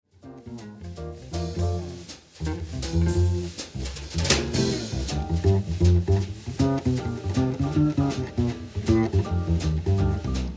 solo

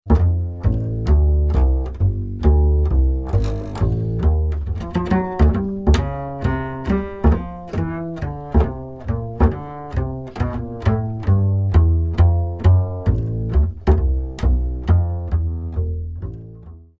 walking

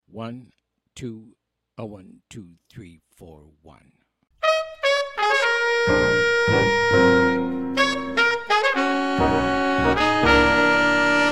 minus drums

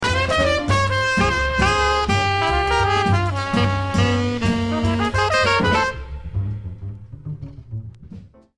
interlude

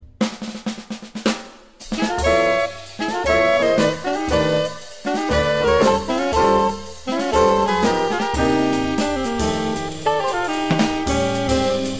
minus bass; solo piano (rubato); solo drums (in time)

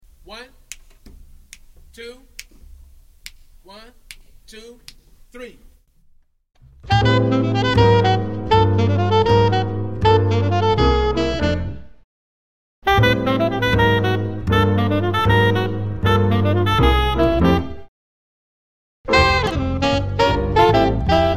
minus drums

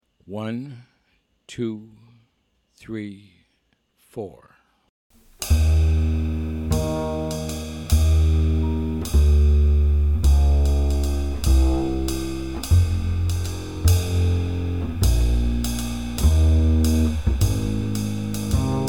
Waltz minus Piano

